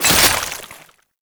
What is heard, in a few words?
Wrist Gun Alt Shot React; Warframe; Tenno; Incarnon